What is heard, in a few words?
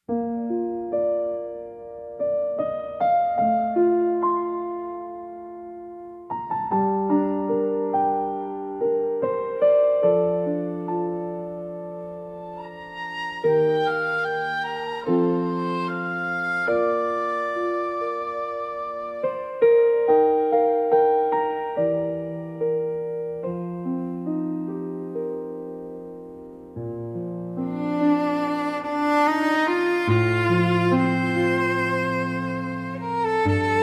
fantasy music nature forest